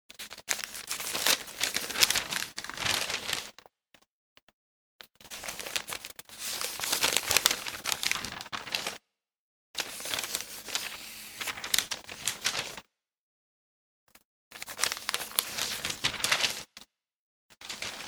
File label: Reading Newspaper, Turning the pages of a large newspaper.; Rustling; Objects